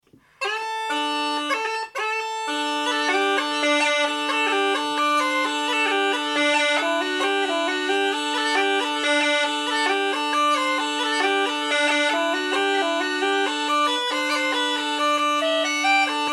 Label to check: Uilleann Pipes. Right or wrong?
Right